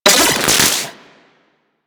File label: Split Laser Beams Join, Weapons; Warframe; Corpus